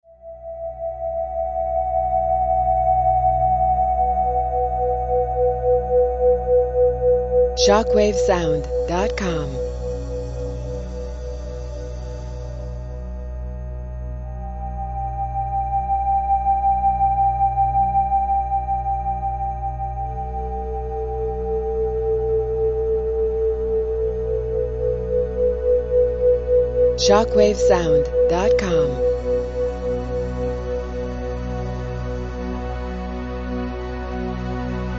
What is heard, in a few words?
drone and bow